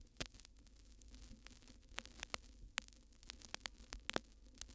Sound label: Vinyl Crackles